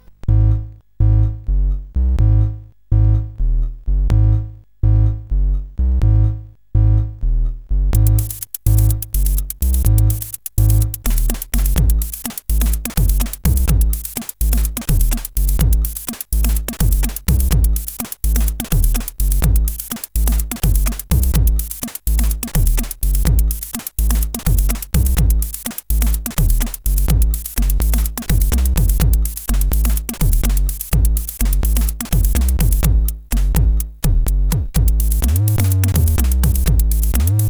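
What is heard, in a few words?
Bassmix, MOS8580R5 sid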